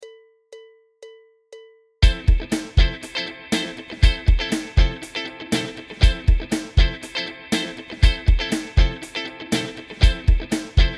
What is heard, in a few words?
impro fm7